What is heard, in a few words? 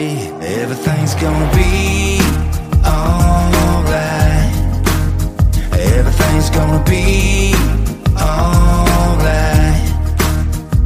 ringtone